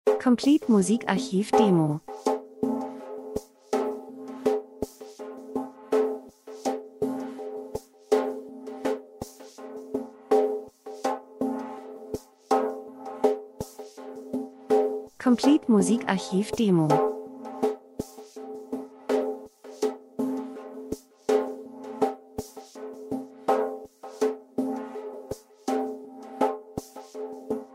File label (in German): drums, rhythmus; Percussion monoton gleichförmig schwermütig eindimensional langweilig Reise Dokumentation Natur